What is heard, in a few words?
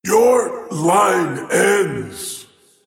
attack pain; void spirit